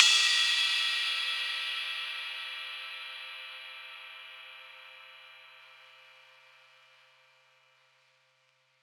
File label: ride; eddyflux